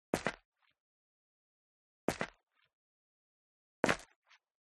STEP Dress Boots Concrete Grit Single Right; FOLEY; smartsound

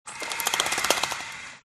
open store

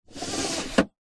vehicles car suv nissan patrol 1998 seatbelt pull

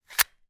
weapon gun ruger mark iii; still north media

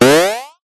game sound classic jump

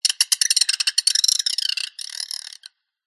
squeaky metal Designed Alien Bird; PMSFX

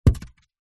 household foil tray squashed ball drop carpet

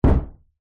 impacts wood object heavy drop wood floor; zapsplat

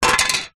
transport license plate down on surface

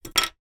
plastic nail brush down on surface

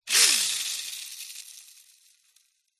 leisure toy plane pull back motor release whoosh

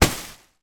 foley bin liner stuffed with clothes kick; zapsplat

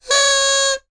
whistle party noise maker; foley; sound effects; sound effects one

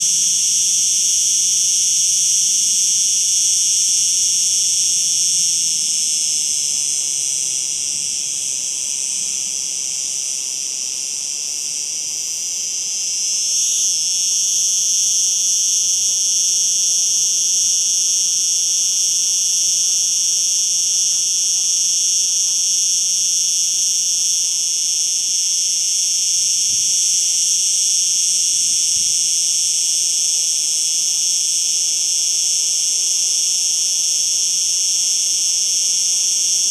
animals insects cicads spain field

